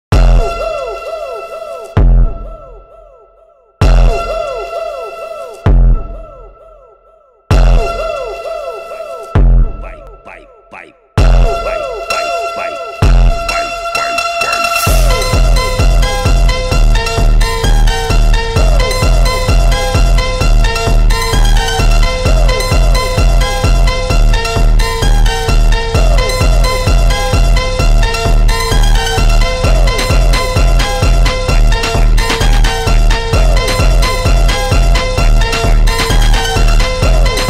Phonk

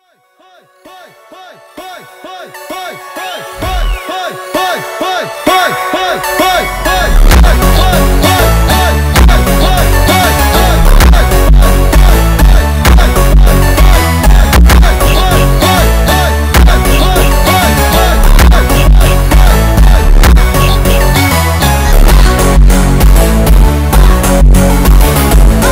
Phonk

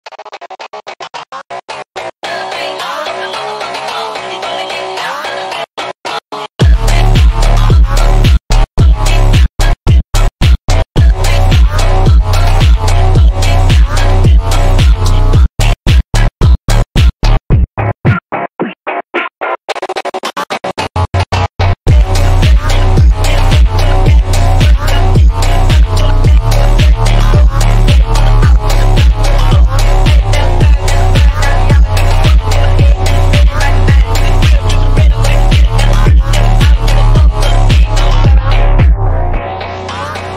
Phonk

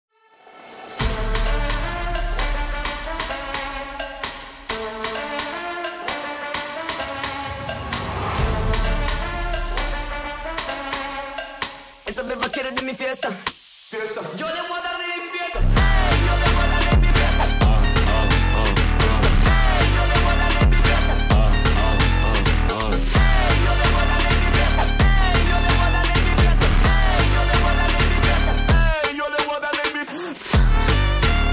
Phonk

